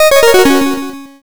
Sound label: gameover